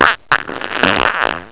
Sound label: fart; T&P Fart Central